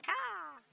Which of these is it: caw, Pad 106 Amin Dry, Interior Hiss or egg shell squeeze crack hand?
caw